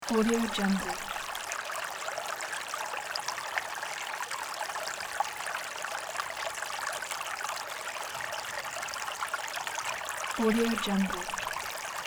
stream small; justsoundeffects

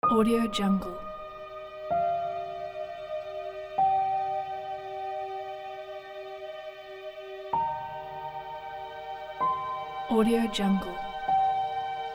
warm emotional angelic piano strings; p4lash

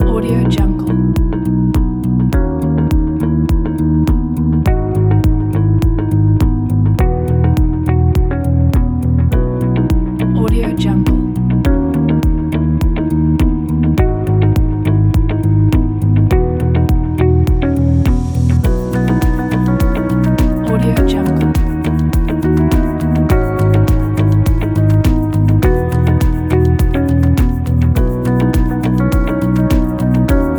ambient inspire kit

